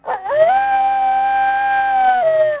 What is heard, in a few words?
wolf howl; The Elder Scrolls III Morrowind GOTY; XBOX